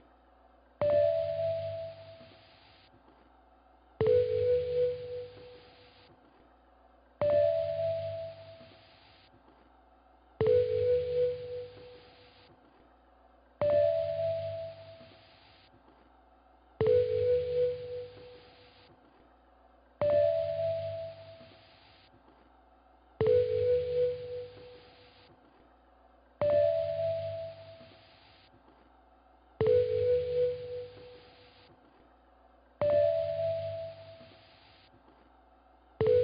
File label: 150 BPM A Min Accent; Loop Stems; Cymatics; Mixed Feelings